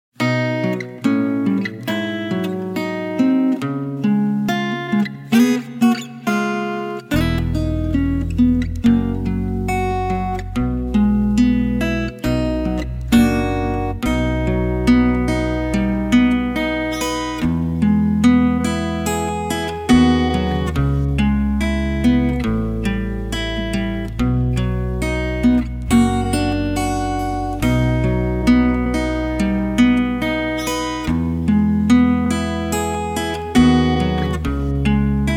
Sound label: No Backing Vocals